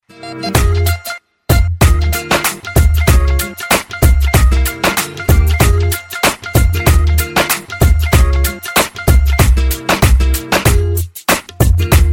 punjabi beats iphone ringtone

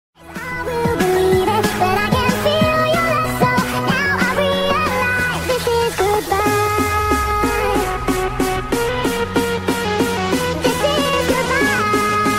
funny ringtone

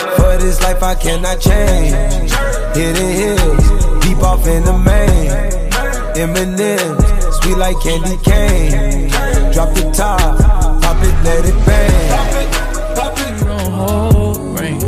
new hip hop ringtone

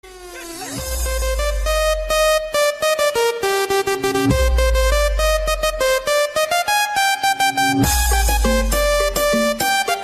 trance dream ringtone

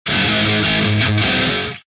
alert hard knocks power chord ringtone

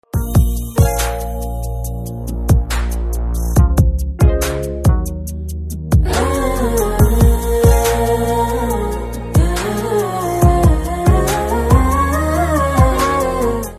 telugu theme tune ringtone

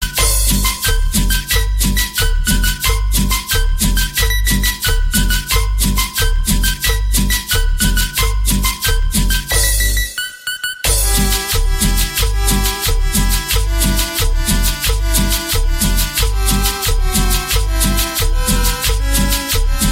cumbia ringtone

